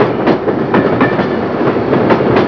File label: train slow, ambience